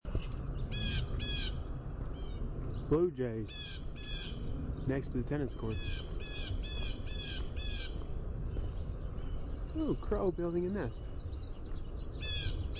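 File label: Blue Jay jeer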